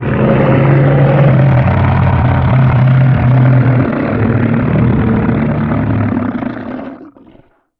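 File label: die electric; slender; garg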